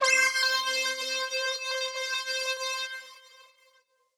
SYNTH Skeletons; bboy-maniac-drumkit

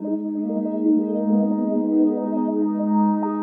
ACCENTS 140 G Minor; Currency; BBoy Maniac; bboy accents phrases

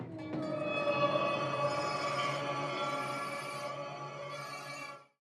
C Minor 165 Ethnic; thermal mulitkit 2024

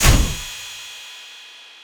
Crash Stomp Crash; BBoy Maniac

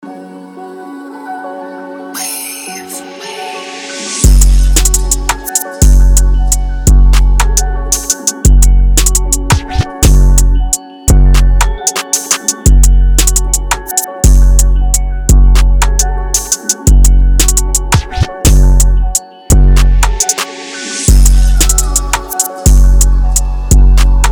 Beat Full; BBoy Maniac drumkit

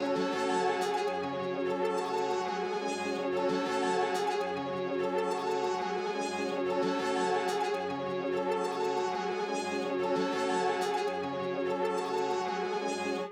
PHRASES 144 F Minor; Scope; BBoy Maniac; bboy accents phrases

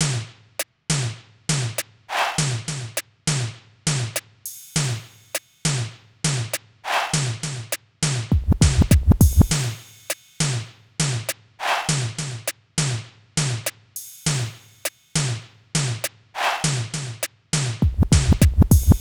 Beat Builder, drumkit; BBoy Maniac; Boutabag

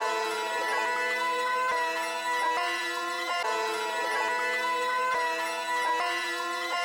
PHRASE 140BPM Am; Cliche; raevn creative soundscapes

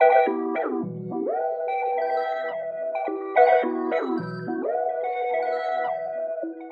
ACCENTS 143 D Minor; Stak; BBoy Maniac; bboy accents phrases

